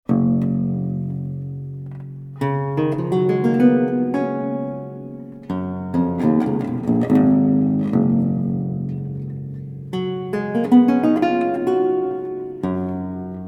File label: Prelude, lute; de Visee